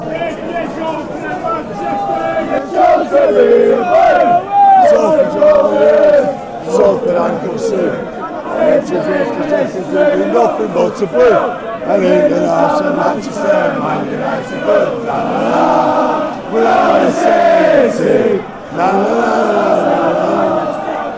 if you come from manchester fan chants

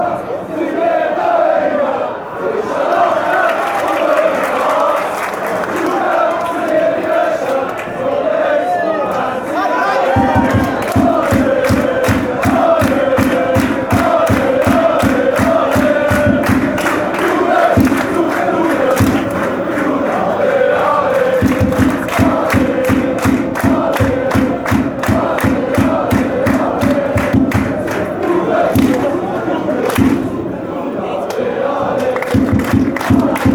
ale ale ale, fan chants

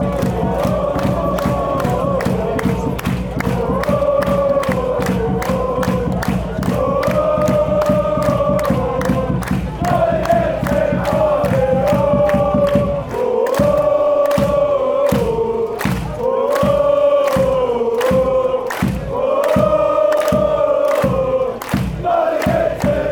ohhh allez allez oh, chants; gc

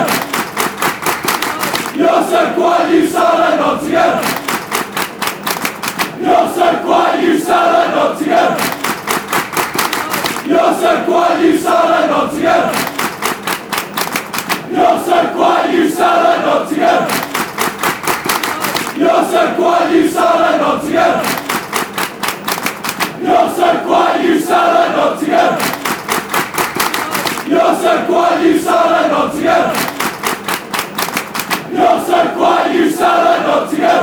youre so quiet you sound nottingham fanchants

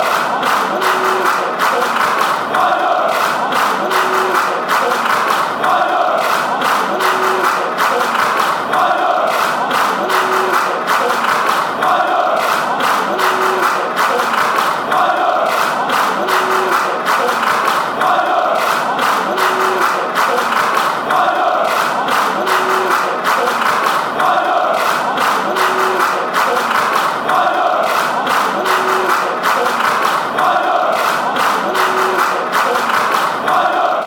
clapping, fanchants; feyenoord

